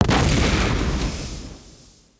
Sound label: ship explosion; destructibles